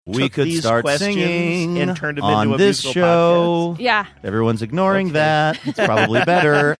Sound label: singing we could start singing on this show